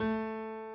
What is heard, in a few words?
key